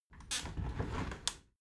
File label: window handle squeak turn open halfway soft